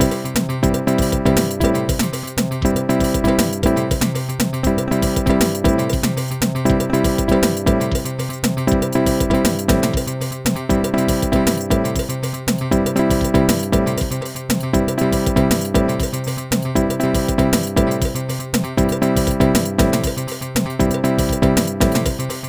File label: dance mix style; SuperJAM!